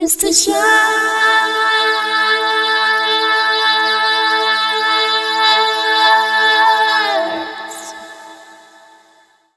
VOICE FX, Dance Vocals

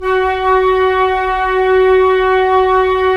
Flute, Roland LA Composer

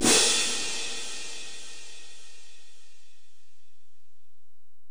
Korg x5, Drums

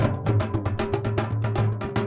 INDIA L; Spectrasonics - Heart of Asia CD2

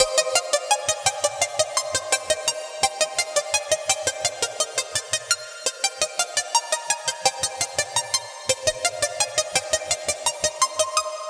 Melody, IDM

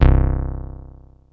Bass Synths hits and octaves

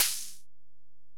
HiHat, Alesis DM-PRO

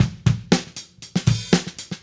Drums, 70s Analog Funk; Sonic Foundry (Sony Creative Software); What it Is!; Good Times

